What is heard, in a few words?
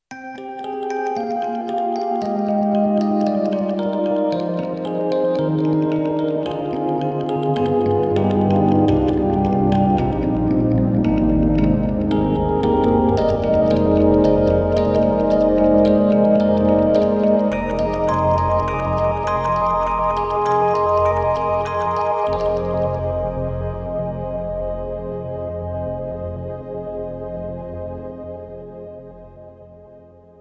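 SD-80 Ambient Electric Piano; EDIROL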